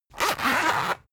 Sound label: zipper run long fast; objekte; reissverschluss